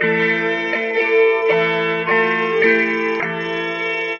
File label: guitar, Jazzmatazz loops